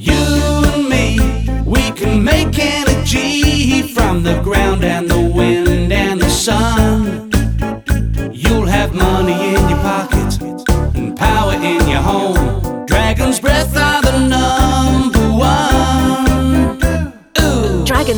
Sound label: melody; Dragons Breath Solar